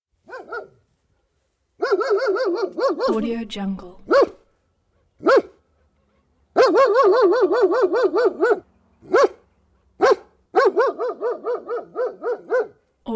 german shepherd constant barking regal

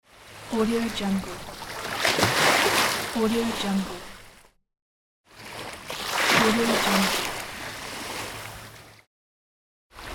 wave

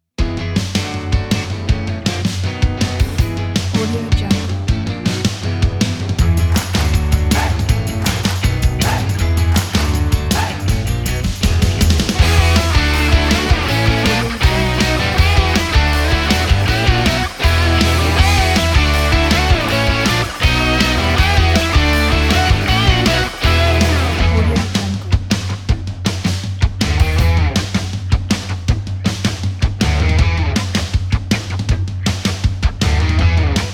feel good rock

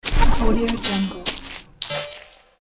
delete icon; jbobstudio

